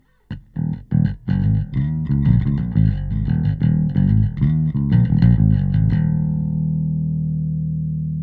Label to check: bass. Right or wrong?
Right